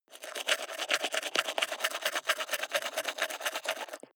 teeth brush wet open inward

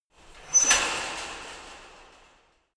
metal fence big rattle

